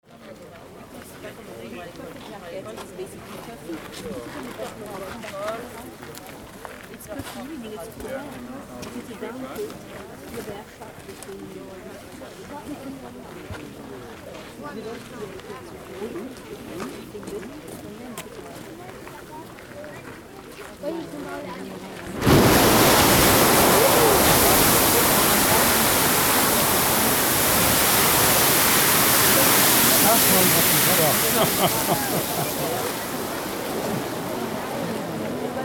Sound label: Geisir Strokkur Sounds